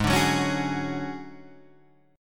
D Major 7th Flat 5th G sharp, chord

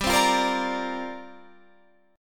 C 7th Suspended 2nd Suspended 4th, G

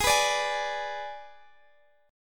G sharp Diminished, Guitar, Standard; strummed; x,x,18,16,15,16

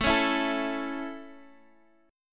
A Major, Guitar DADGAD

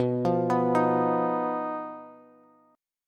B Major Flat 5th B Bass; BMb5; arpeggiated; 19,20,21,20

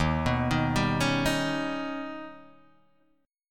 Eb Augmented 7th, Guitar, Open D

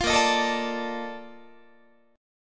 A sharp Major 11th Guitar, chord; strummed

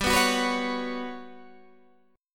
G Major 7th Flat 5th, Guitar, Open D; down

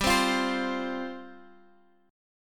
F 5th G, F5/G chord; strummed

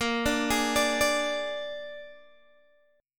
A sharp 7th A sharp, Guitar, Open G

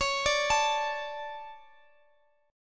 D 5th C sharp; D5/C# arpeggiated; JGuitar

